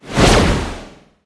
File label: basic fire; smite; chronos